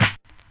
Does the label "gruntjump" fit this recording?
No